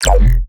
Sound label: bass hit high res F; Virtual Riot